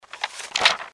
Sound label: page turn